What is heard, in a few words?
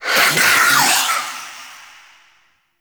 Life Screams; Warframe; Dante